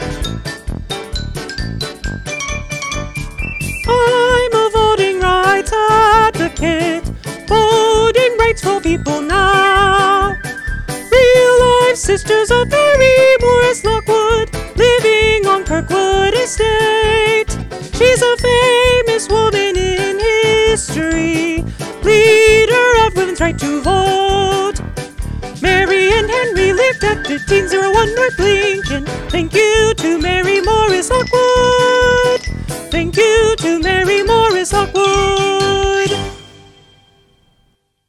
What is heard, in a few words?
Vocals